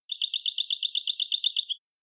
cascabel

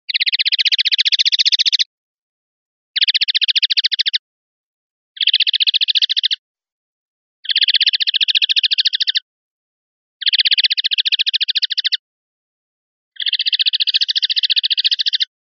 torreos, pardillo

